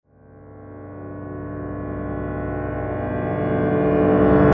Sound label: Reverse Piano